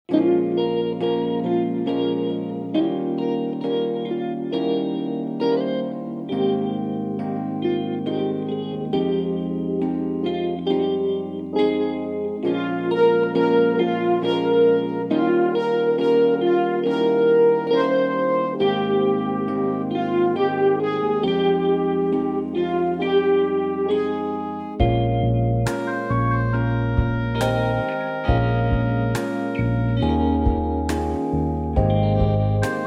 Ab No Backing Vocals, backing tracks

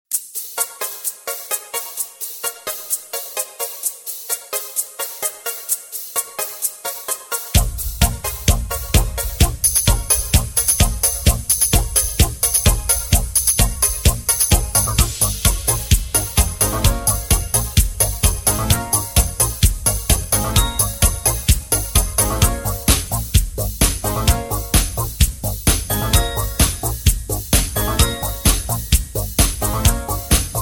(Bbm) No Backing Vocals

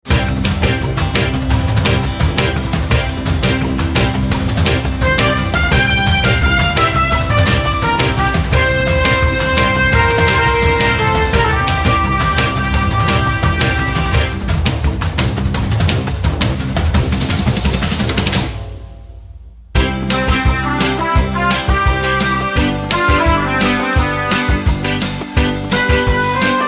Bossa Nova (C) No Backing Vocals

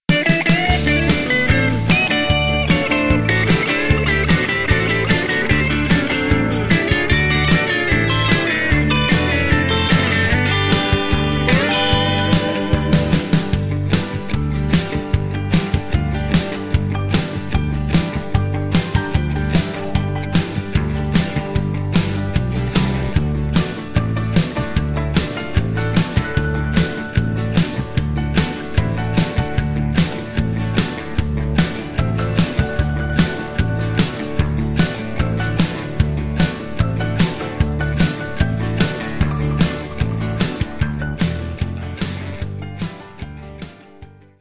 (G) No Backing Vocals

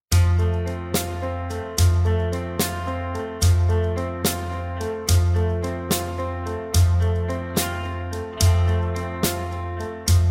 (F) No Backing Vocals

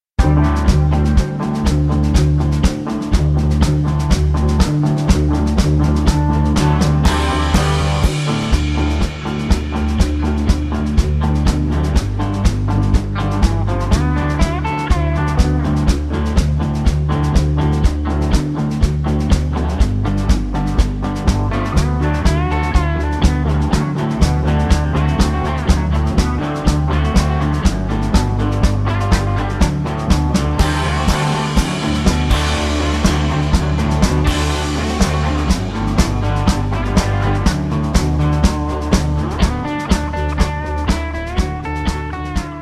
(D) No Backing Vocals

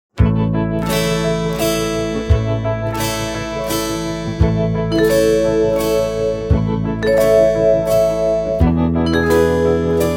A No Backing Vocals, backing tracks

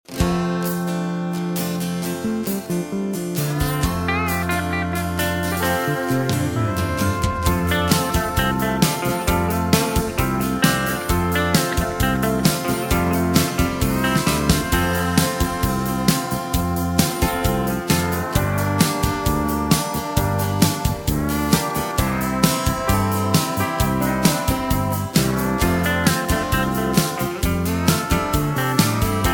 F To Gb No Backing Vocals, backing tracks

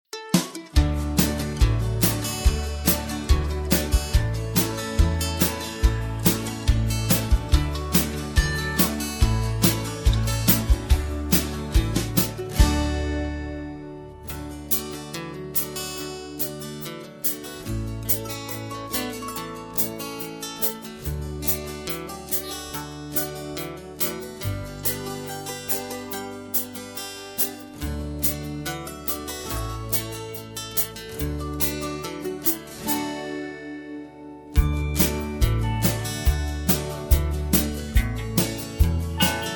D No Backing Vocals, backing tracks

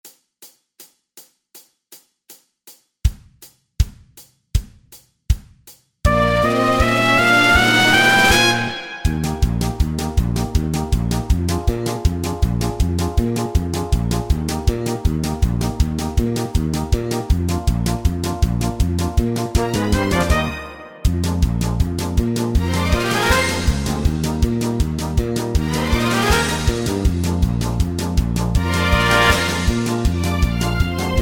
Polka Eb, backing tracks

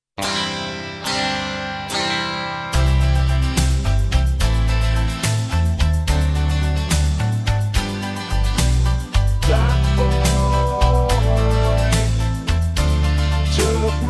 With Backing Vocals

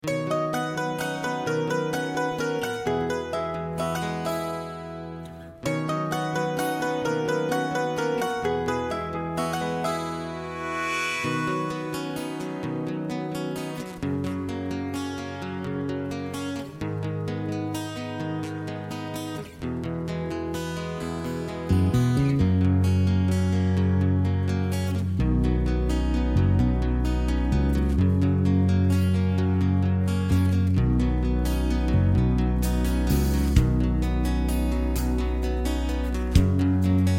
B No Backing Vocals, backing tracks

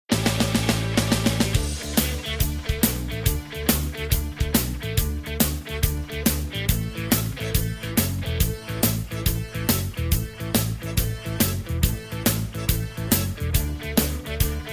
D No Backing Vocals, backing tracks

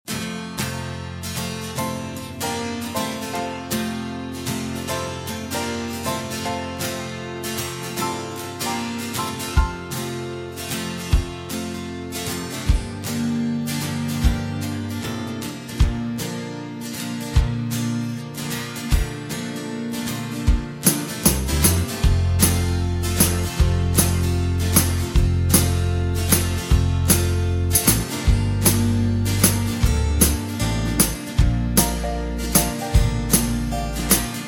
(F) No Backing Vocals

